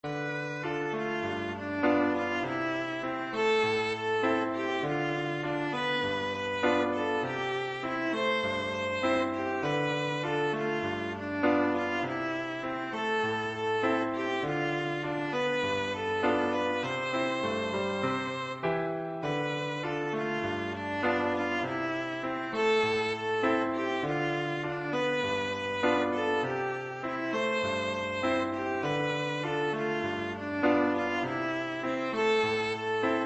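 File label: latin violin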